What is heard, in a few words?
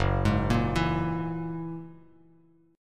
F sharp 9th F sharp Bass Standard; F#9 arpeggiated